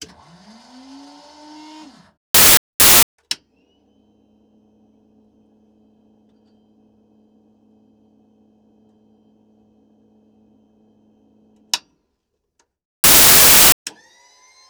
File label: foley buttons switches canopy dashboard, North American P-51D Mustang; Pole Position